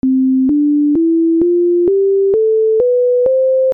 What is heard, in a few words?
c major scale